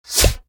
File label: Blow Pipe; gamerip